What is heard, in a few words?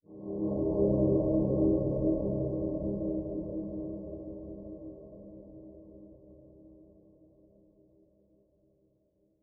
dark